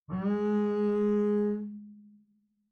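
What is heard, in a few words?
Bass arco fortissimo sul A Ab3, Double Bass; MIS Pitches - 2014; Strings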